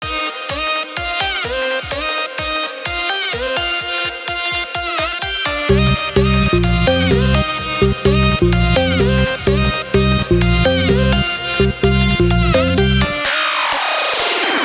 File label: Garage Band